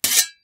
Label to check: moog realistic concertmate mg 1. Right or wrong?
Wrong